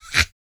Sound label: GRUNT, MALE HUMAN; ILIO; Vocal Planet